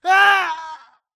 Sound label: grunt death crush; Titanfall 2